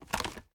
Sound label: reload fast pull; reloads; gmod